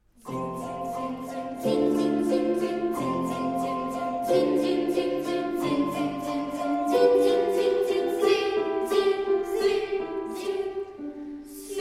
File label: school Choir